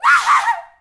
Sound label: girly scream; female characters; AoM-ARTofMyth